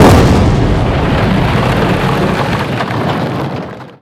Pirate Ship Collision; Zelda

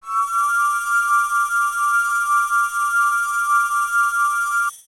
Spiritual Stone Summon; zelda; OOT

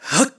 Sword Charge; Link; Skyward Sword; zelda

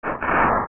Rebound; Moblin

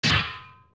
sword rock; Sword hitting a rock (3 pitches); OOT

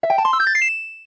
Get Heart Piece; Getting a heart piece (stereo versions heard when the heart piece is picked up near the sides of the screen); LTTP

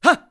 Roll; Link rolling (also a backflip)

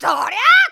Spin Attack; Link's spin-attack shouts

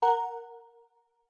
Calibrate; Zelda

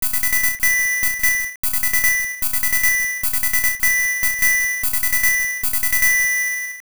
Coral Triangle; Sirens Instrument; The Coral Triangle

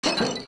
Lantern Shatter; Poe; zelda; OOT

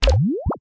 Picto Box Enter; Enter the Picto Box view (also used for the Telescope) (Recorded)

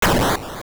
Enemy Die; An enemy killed (also Gohma's claw breaking, Medelock's [OOS Boss 8] formation completed, and more); Zelda Oracle

